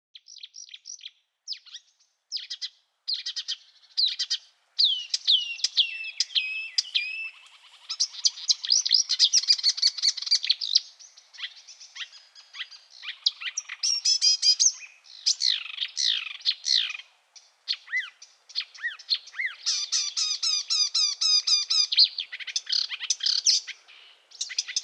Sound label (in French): Chant d oiseaux